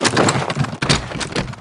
bazooka pre; Iris Watson

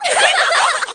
shadow laugh; p4u; aigis

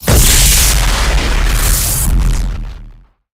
evidence; thoughtroute

